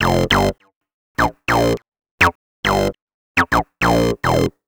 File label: Syncro Bass, Synth Bass Loops; Sonic Foundry (Sony Creative Software); Groove Elements